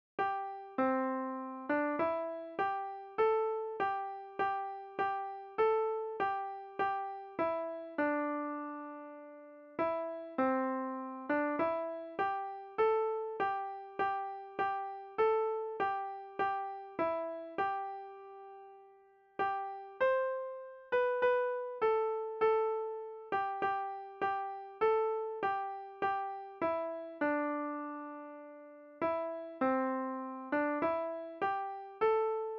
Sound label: hymn melody